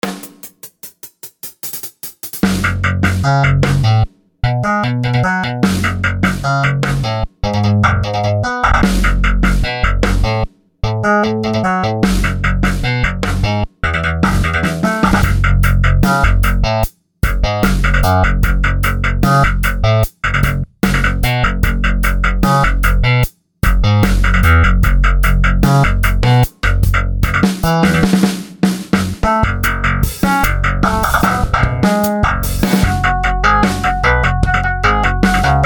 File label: bass git